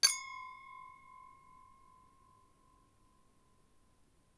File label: crystal; cy crystalcave